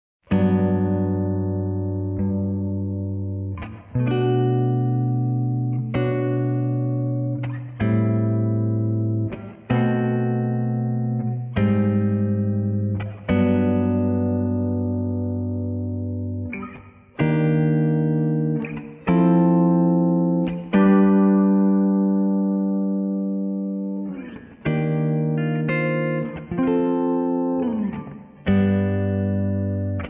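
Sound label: Rhythm Guitar, Martini Lounge; Surround Session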